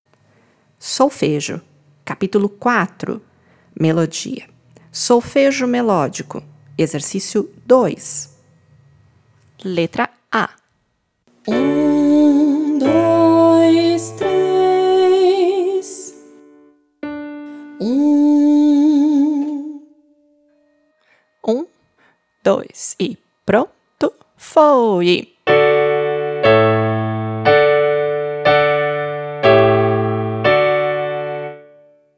Solfejo Melodia Solfejo Melodico

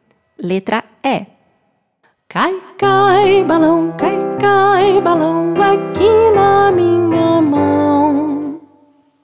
Melodia Contornos Melodicos; PERCEPCAO

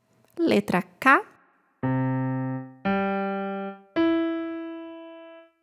Melodia Contornos Melodicos; PERCEPCAO

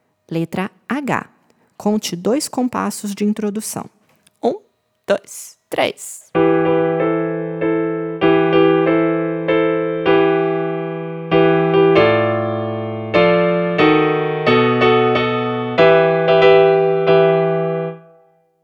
Ritmo Solfejo Ritmico

